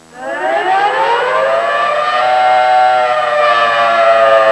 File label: AIR RAID; trasporti; soccorso